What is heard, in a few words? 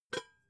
pot lid small shake; objekte; topf